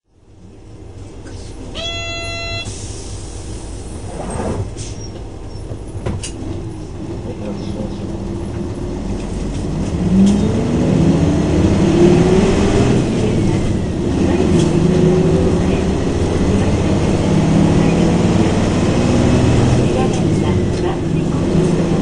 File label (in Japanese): 走行音, 三菱 U-MP618M; 仙台市交通局; 実 沢; 木町通一丁目→木町通小学校前